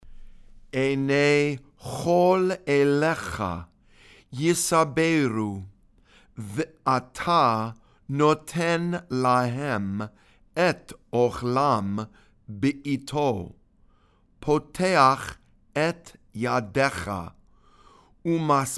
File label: ashrei read and sung